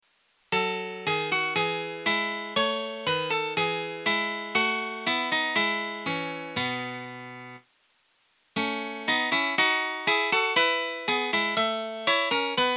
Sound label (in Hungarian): E-dur intro gitar duettre